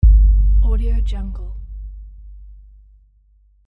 deep bass boom

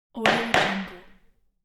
judge gavel

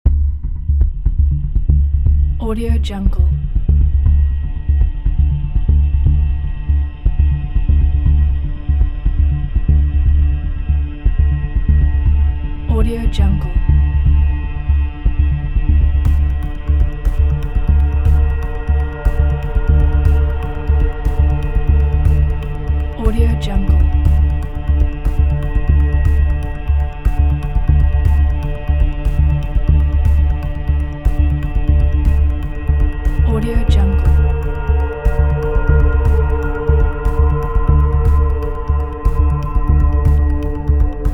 documentary promo

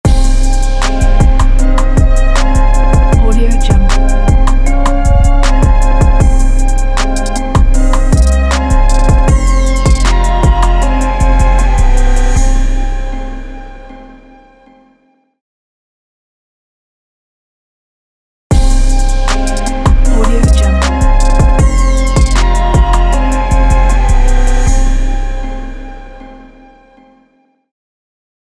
trap soulful logo

